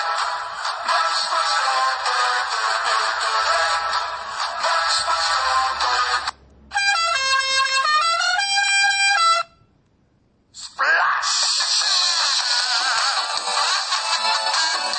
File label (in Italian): mix canzoni